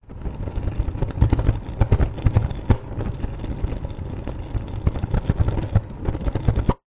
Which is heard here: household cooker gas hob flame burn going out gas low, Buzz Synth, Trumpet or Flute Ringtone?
household cooker gas hob flame burn going out gas low